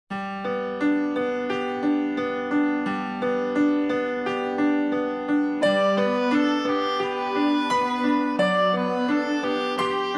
Easy Listening & Classical; Sound Ideas

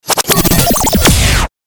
Galery Noise; Sound Ideas - Galery Noise 001

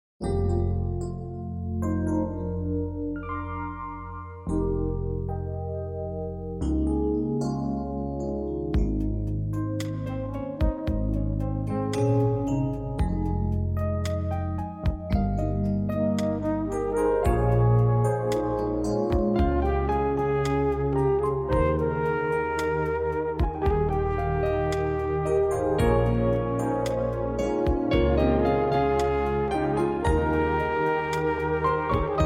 Easy Listening & Classical; Sound Ideas

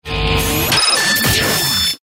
Galery Noise; Sound Ideas - Galery Noise 001

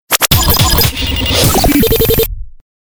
Galery Noise; Sound Ideas - Galery Noise 001

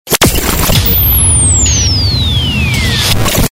Galery Noise; Sound Ideas - Galery Noise 002

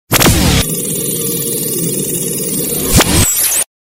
Galery Noise; Sound Ideas - Galery Noise 002

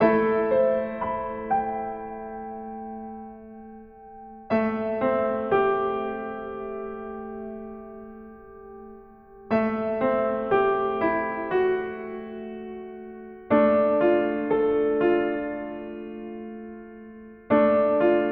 Lost, melancholy, piano

